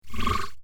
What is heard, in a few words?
Science Fiction Vibrating Laser; star wars / trek style games; Andrew Fleming